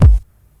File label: 2000s subtle reverb rap kickdrum g sharp key